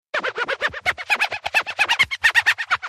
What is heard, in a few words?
scratch